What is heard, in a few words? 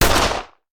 Hand Gun; Sound Ideas; Guns